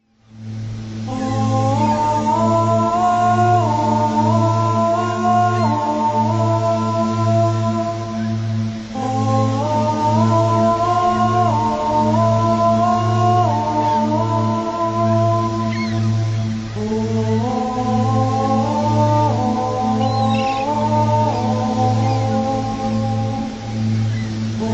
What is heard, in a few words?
Eve's Chant